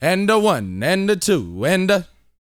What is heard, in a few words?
VOX; R&B Dance; COUNTING; Spectrasonics; Vocal Planet